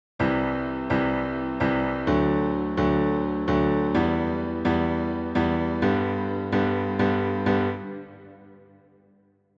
Piano backing

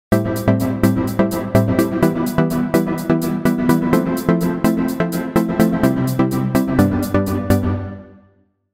Synth predelay

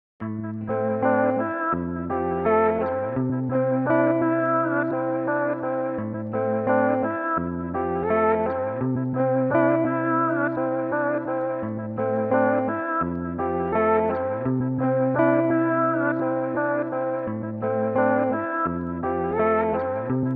guitar after

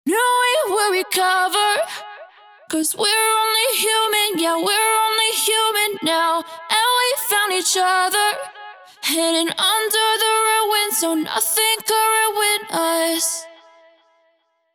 Vocal delay

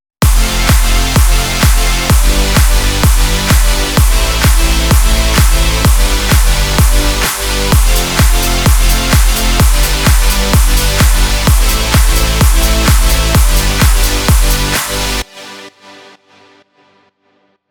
Beats

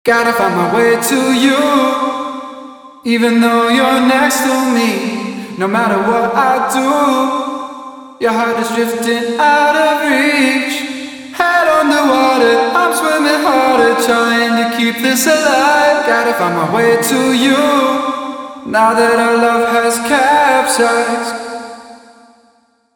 vocal reverb no eq

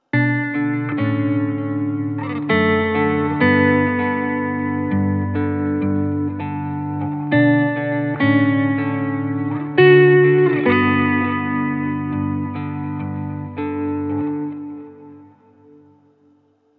Guitar Wet Delay Rev

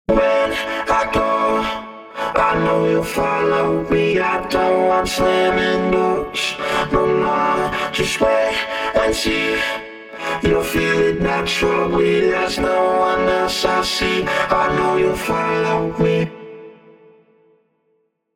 Vpcal Synthe solo

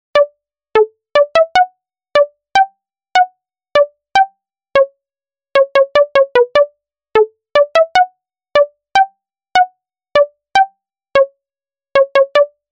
Pluck Dry

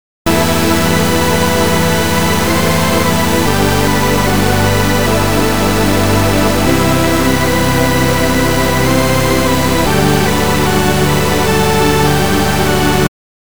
SUB Noise

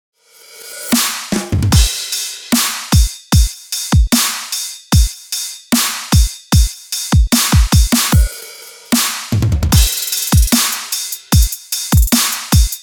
drums

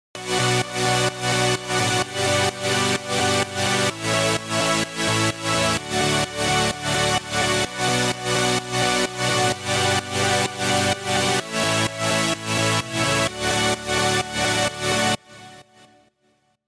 Chords

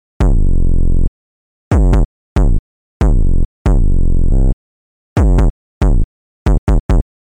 Bitcrusher Overdrive

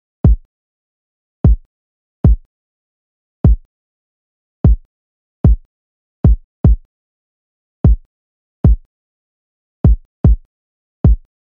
Kick

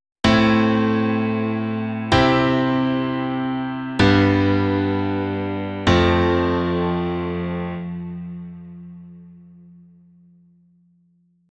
piano

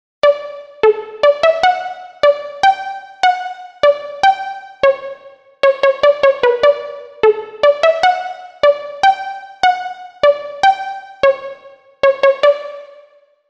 Pluck Plate

